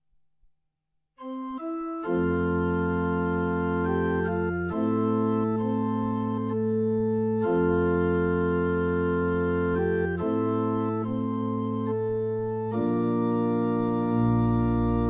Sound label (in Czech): varhany